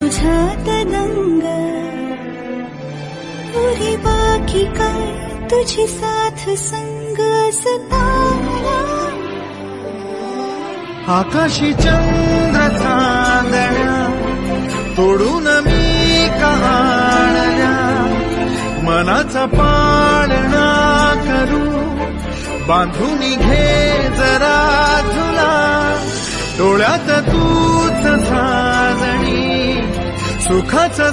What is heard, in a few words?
Female Ringtone